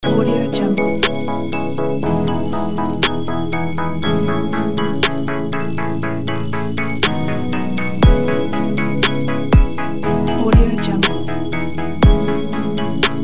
warm summer evening

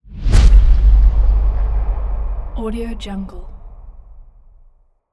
tense transition

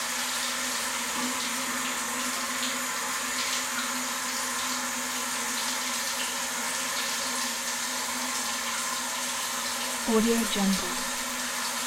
household toilet water open top refill loop; blazznet

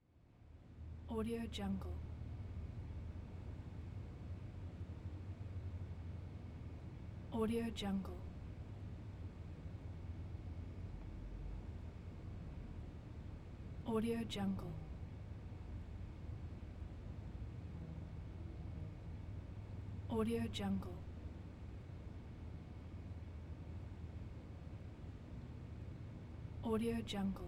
apartment interior ambience

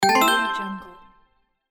peppy achievement chime

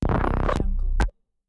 glitch

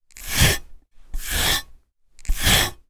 skinning crafting knife swipe; empire game profession; leather hide

